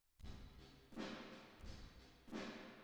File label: Drums Verb